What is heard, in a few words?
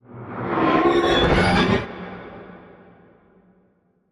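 Reverse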